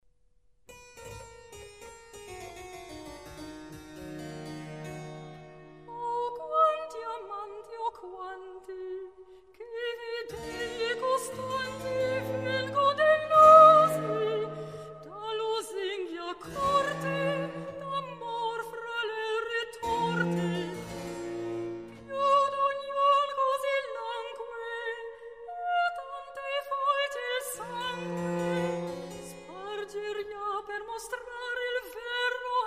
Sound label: Cantata for Soprano solo; Allombra di sospetto; RV678; Antonio Vivaldi